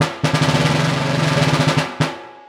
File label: snare